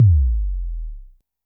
korg trident

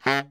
BARI FF C#2, Sax; Greytsounds - Philip Wolfe David Crozier's Saxophones; BARI SHORTS

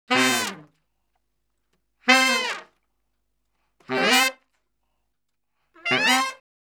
Salsa Picante; Masterbits; World Zone VOL-3

